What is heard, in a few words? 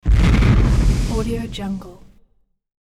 flame burst low whoosh; hollywoodedge